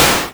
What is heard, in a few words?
Explosion